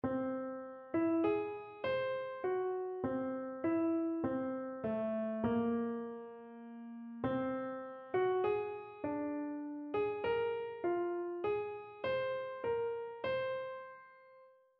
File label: melodic error detection; ear training